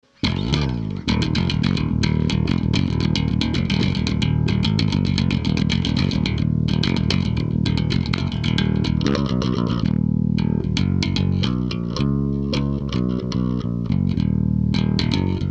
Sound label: Layered Bass